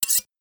Knife Scrape

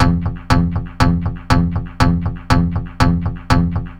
Bass Line

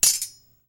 Sword clash

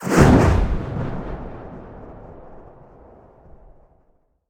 Very Strong Air Blow Whoosh

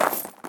Knight Left Footstep On Gravel With Chainmail

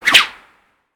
Arrow Flyby

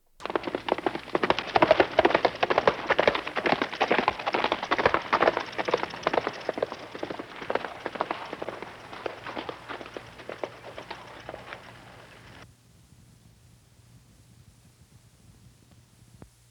Horse Gallops And Stops On Stone

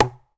Hit Wood

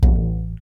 Bass Metal Thud

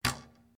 Bow Release

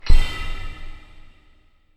Horror Stinger Sliced

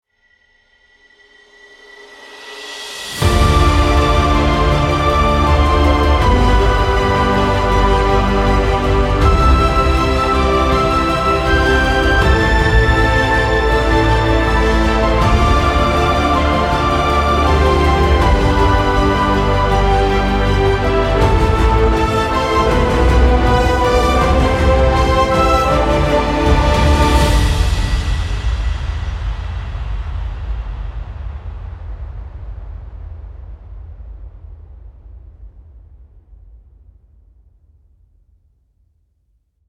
Epic Heroic Soundtrack

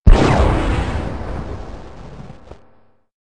Shoot Off Ringy

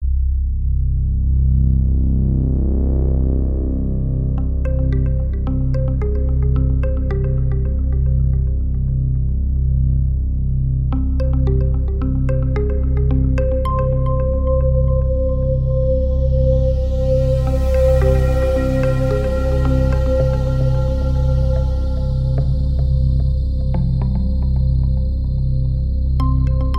Arc Of Suspense

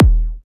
Muay Kick

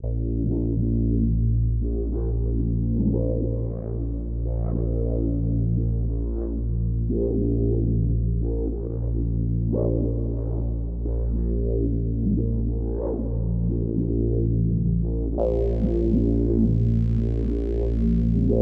Didgeridoo

